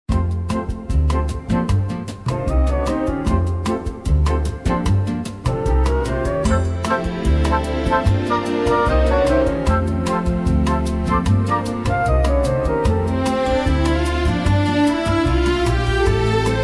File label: Bossa on the Boulevard; suedamerika